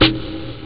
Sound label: Log; jazz jackrabbit 2; M8